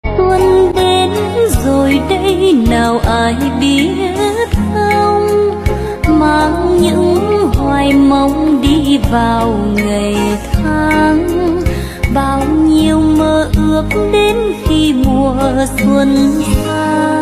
Lk Nhạc Xuân Bolero Nhạc Tết

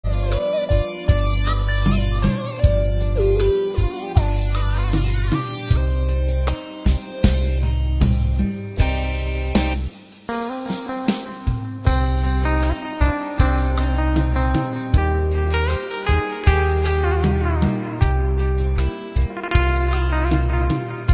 Hòa Tấu Guitar Bolero Không Lời LK Rumba

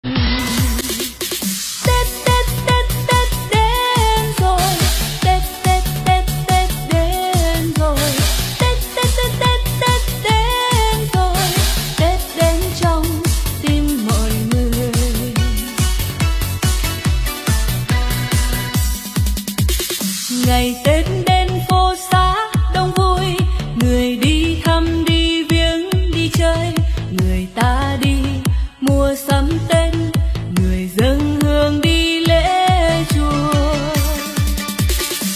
Nhạc Tết Remix

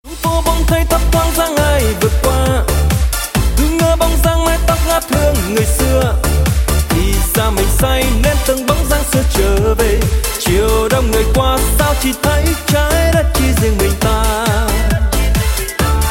Nhạc Chuông

